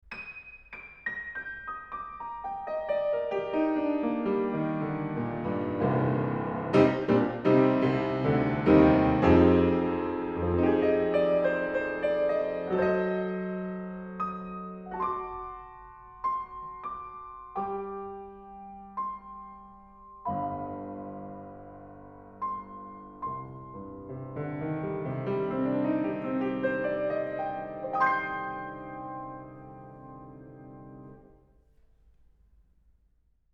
Piano, Horiz Open Mic

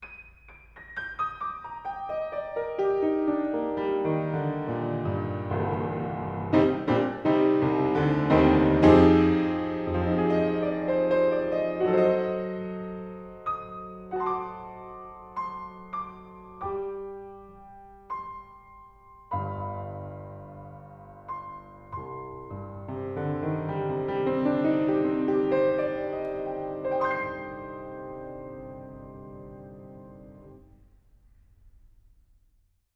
Piano, Distance 220cm Mic

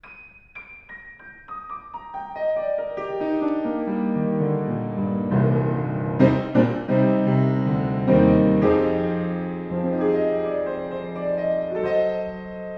Piano, Horiz Closed Mic

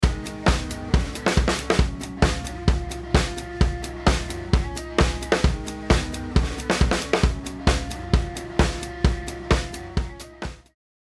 Solo Drums; Recording Mistakes

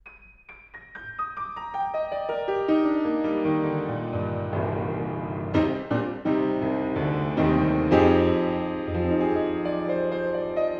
Piano, Centre Mic

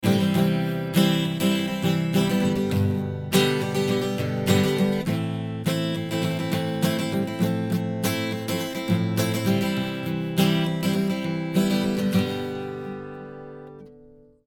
Fret Buzz

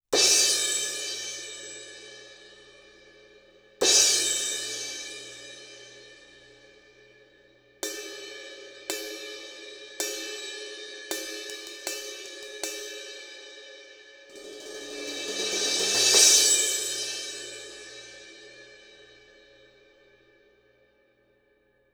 Cymb Crash; Far Mid

